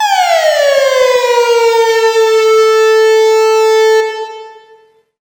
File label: popper; Zaps and Beeps